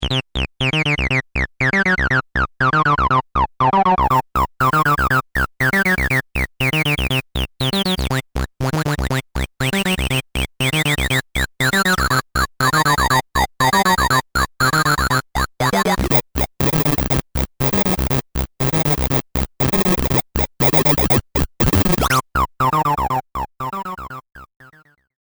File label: tx6 Crusher